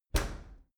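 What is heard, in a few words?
dry cleaner open soft, maschinen türen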